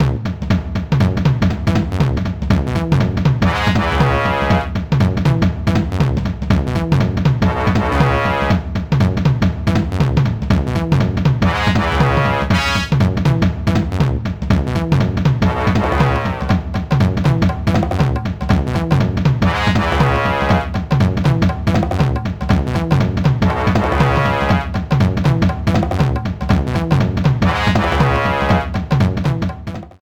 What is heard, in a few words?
Jumpy Jungle Menu, Music from the stage select